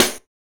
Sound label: SD, dance drums hip